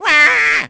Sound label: waaaa; Enemy; DS; NEWSMB